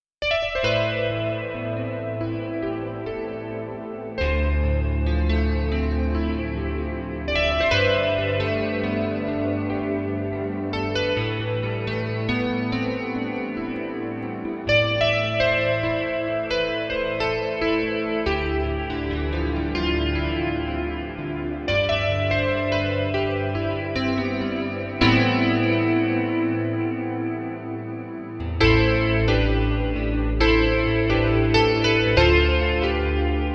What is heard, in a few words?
Electric Grand 70